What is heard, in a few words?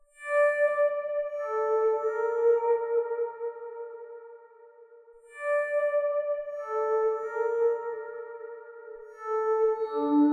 185 BPM G Min Lead, Guitar; Loop Stems; Cymatics; Miami Guitar Pack - Pt 2; Lo Siento